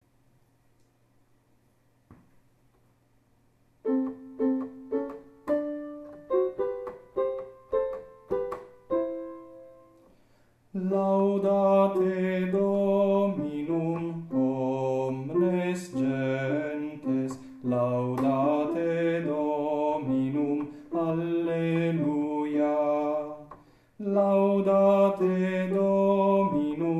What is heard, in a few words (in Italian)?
Laudate Dominum contralti; Laudate Dominum 2011